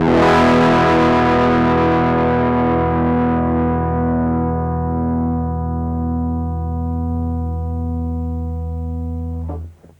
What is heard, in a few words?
amp squeezer heat, overdrive